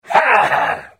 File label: Hit; The Elder Scrolls III - Morrowind